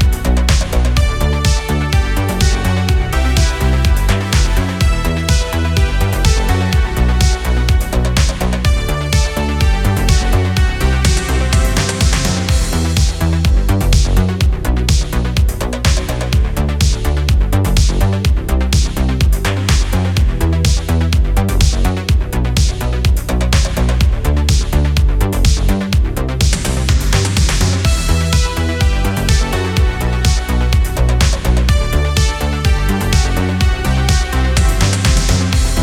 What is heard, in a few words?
Instrumental